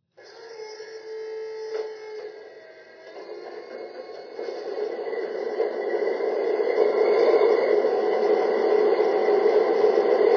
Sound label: dash8, electric trains